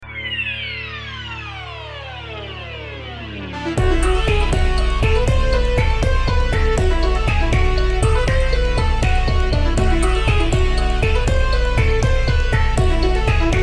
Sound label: Bourree